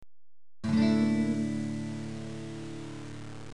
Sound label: Cmaj7add6